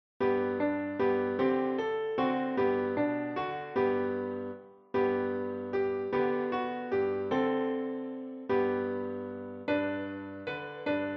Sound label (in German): Klavier; Bunt sind schon die Wälder; klavier level 4